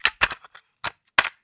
Cassette Rattle

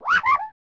"Wahahoo!"; Cool Spot; SNES

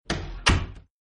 door open sound; Caravan Beast gamerip

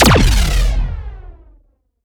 Parry Large Blaster Distorted Cruncher

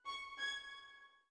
orbit violin; Sky Children of the Light gamerip

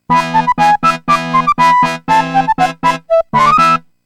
Flute Surprize; Crystal's Pony Tale; Genesis gamerip

